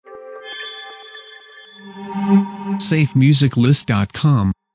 Short Subtle Hi Tech Logo

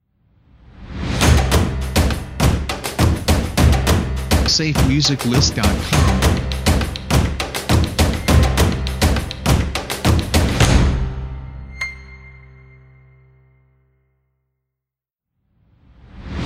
Action Cinematic Stomp Logos; SafeMusicList

